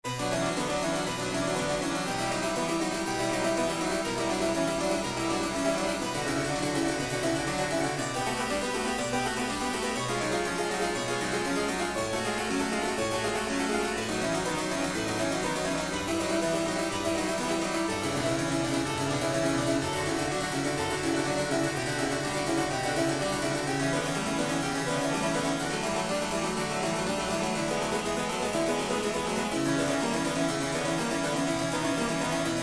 Sync Wavetable Soni

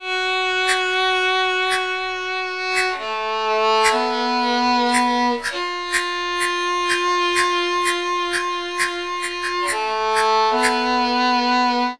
Castanets Violin

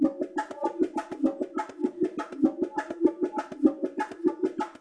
Bongo

